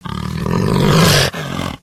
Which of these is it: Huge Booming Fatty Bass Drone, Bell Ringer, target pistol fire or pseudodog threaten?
pseudodog threaten